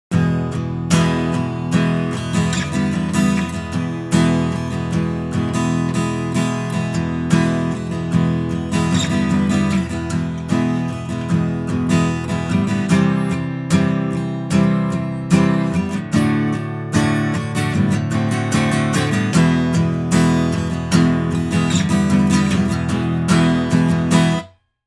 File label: Neumann TLM 102 Guitar